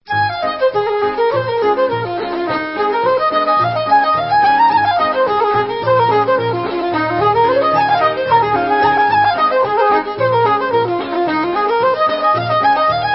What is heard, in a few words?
reels; John Carty's; Feeding the Birds